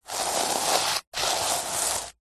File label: household color changing reversible sequin cushion rub; zapsplat